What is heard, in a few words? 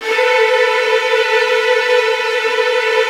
STR WHL TRLL, Virtuoso Strings; ILIO; Kirk Hunter Virtuoso Strings VOL-3